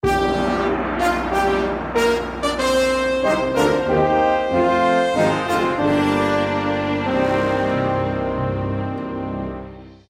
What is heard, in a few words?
Royalcourt Stinger